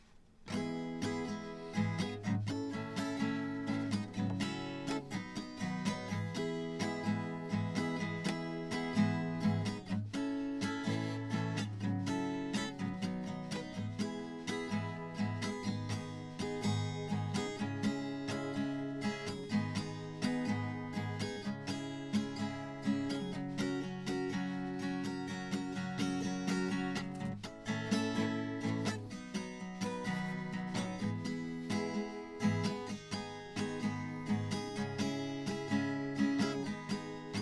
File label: 8beat